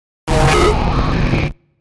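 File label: fast attack; 5.1 STEREO MIX; Blastwave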